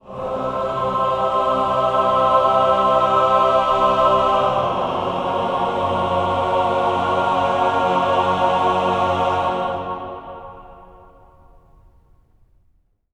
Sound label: GLIDE CHD, Symphony of Voices